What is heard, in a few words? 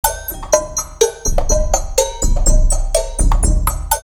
R8 124, drumloops, DrumMachines; X-Static Goldmine; 90 s Sample CDs